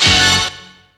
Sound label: Synths C; Vengeance Samples